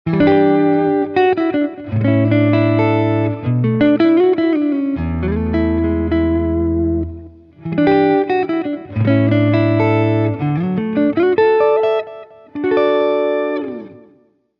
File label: Elliott Guitars Tone Master T-Rex Replica Fender Deluxe Reverb